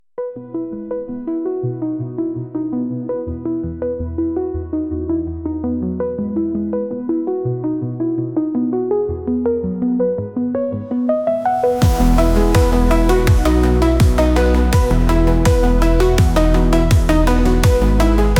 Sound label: Steam